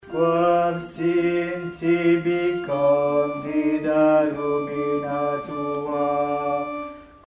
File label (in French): alto; mesures 4-8; ecce quam sit